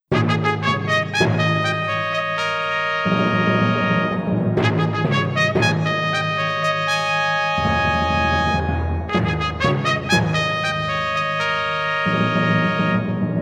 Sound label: Fanfare; Abendanza